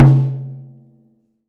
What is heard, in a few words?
Prepared Drums